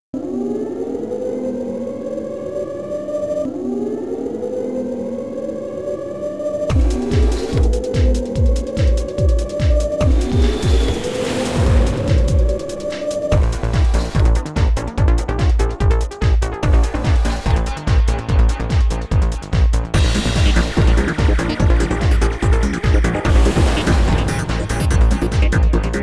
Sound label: Techno Remix; Oretachi Game Center Zoku: Sonic Wings; Techno eJay Remix